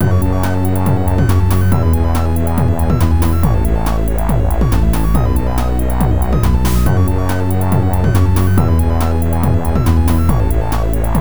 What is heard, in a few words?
Bad EDM